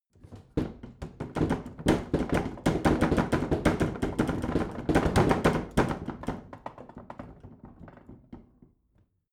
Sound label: bathroom cabinet rattle; objekte; tueren